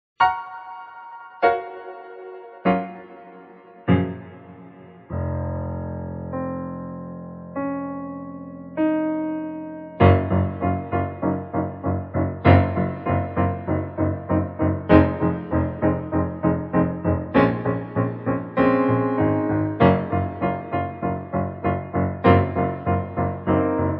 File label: Old Skool Verb